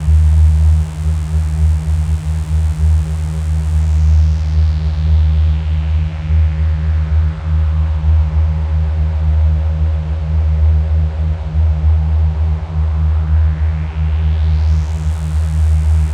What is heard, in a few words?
Synth Bass; Basses